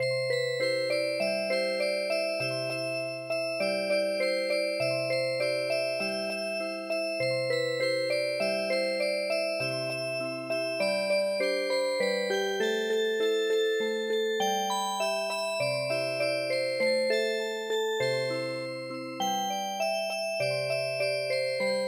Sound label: Music Box; Pustono ludo i mlado